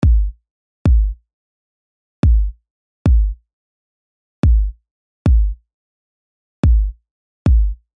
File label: Digital Xylophone